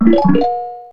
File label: switch; super mario paint